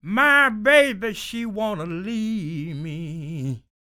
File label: SING, Blues Soul; Spectrasonics Vocal Planet